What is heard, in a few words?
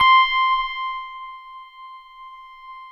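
FM electric piano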